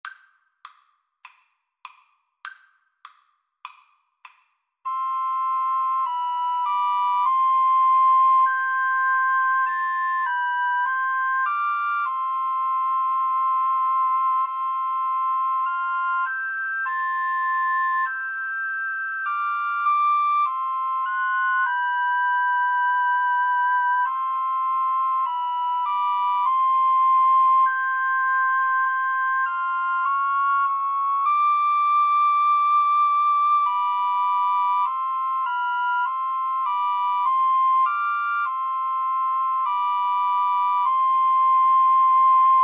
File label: abide with me, Recorder Trio